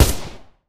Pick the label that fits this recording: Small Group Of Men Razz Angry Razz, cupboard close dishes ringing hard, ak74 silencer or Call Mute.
ak74 silencer